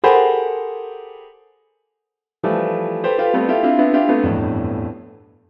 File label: Clusters, jazz piano